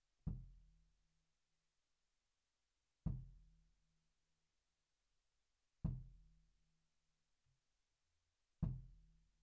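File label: snare top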